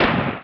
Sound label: gunshot